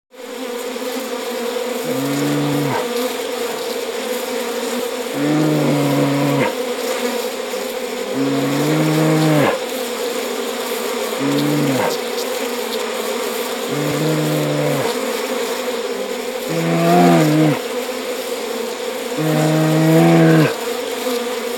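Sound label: bear taking honey from bees; medved otbiraet med u pchel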